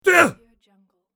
karate voice

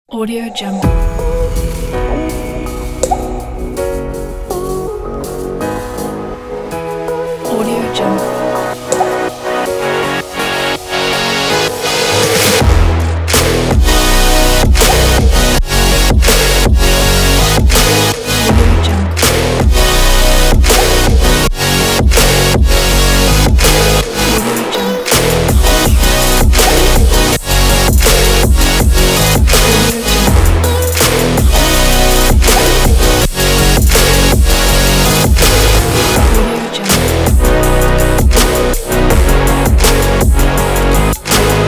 future bass

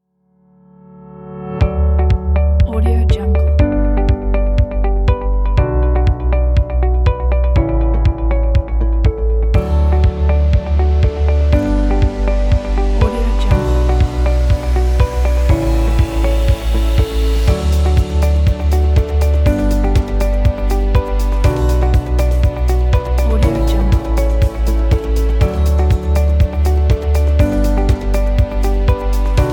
upbeat motivational corporate

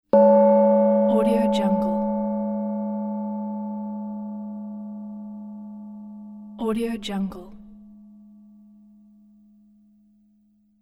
gong

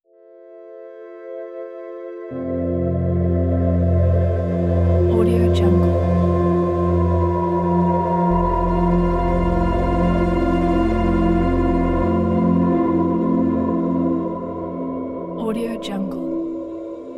african mystery dunes

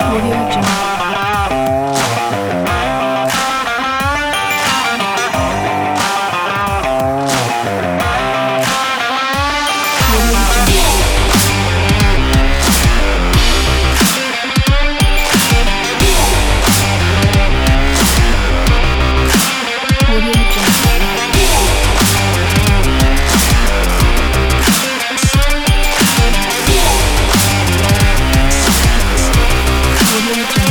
aggressive dubstep sport rock

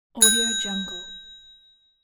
hotel bell ding

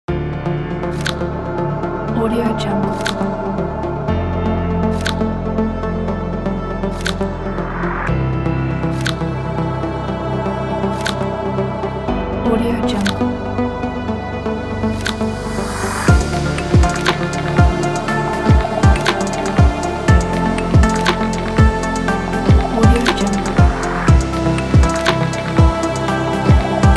the inspiring electronic

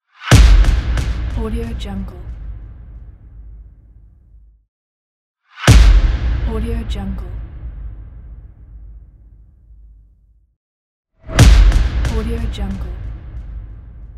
slow motion effect

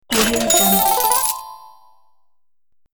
coins jackpot; sonic-boom

